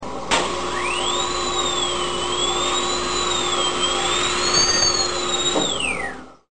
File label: DENTIST